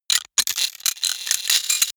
robot rustle click

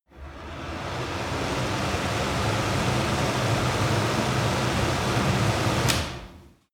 wall fan full sequence

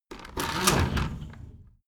window roller shutter open soft single pull

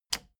plastic button latch loose push enable

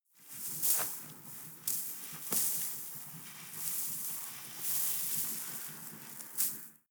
snow trickle soft

